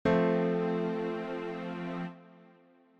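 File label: F Major Chord Triad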